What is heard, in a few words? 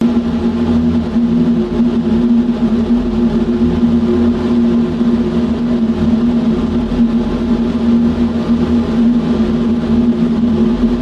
space drone; Audio Mangler